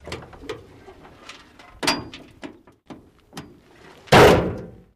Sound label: download Sound, Chevrolet Corvair, Interior Pov, Trunk ( Bonnet ) Open and Close. Good Spring Noise.